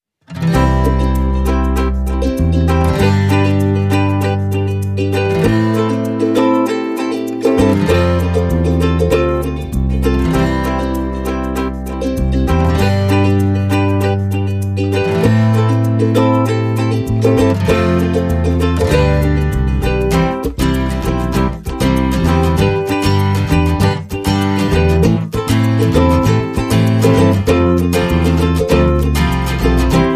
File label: Ukulele & Guitar